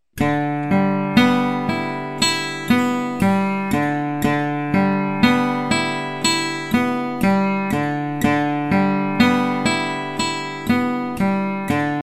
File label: arp pattern, guitar